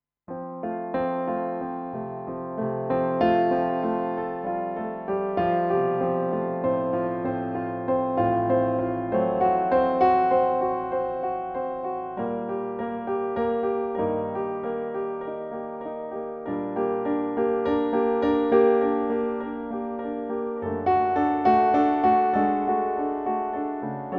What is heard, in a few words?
Sleeping Thunder 100bpm